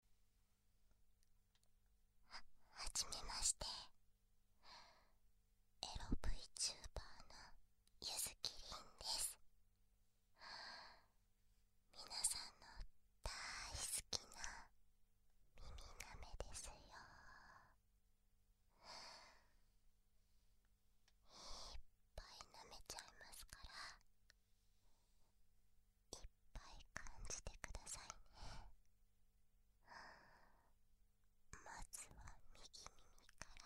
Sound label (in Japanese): 耳舐め 右, ASMR